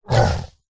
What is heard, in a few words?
horror monster short breathy sneeze or quick exhale